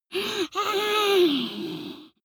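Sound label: witch moan evil, creatures; kreaturen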